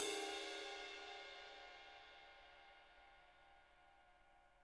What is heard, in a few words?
RIDE, RIDE CYMBALS; Spectrasonics - Backbeat